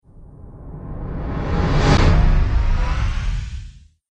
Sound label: Flashback Transition Whoosh